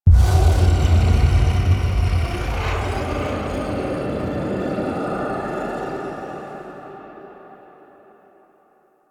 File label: Transmission Start; Entrati Labs; Evil Twin